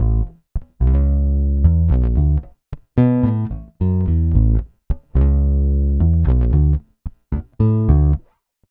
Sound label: Pop, Bass